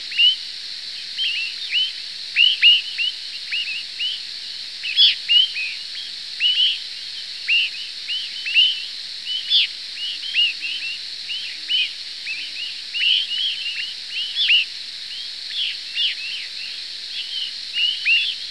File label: swainsons thrush